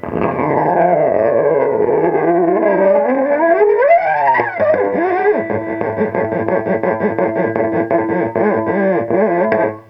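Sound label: DECHIRE, guitar; USB Soundscan vol.31; Miro s Grooves; AKAI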